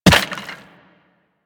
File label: Operator Run Bone, Footsteps; Warframe